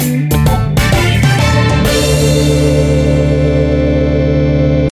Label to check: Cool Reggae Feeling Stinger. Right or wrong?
Right